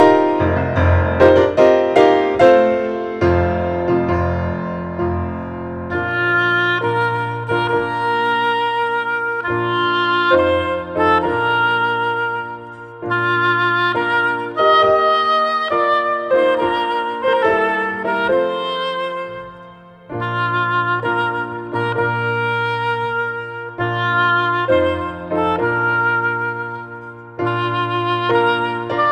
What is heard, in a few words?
Bridal Chorus; Wedding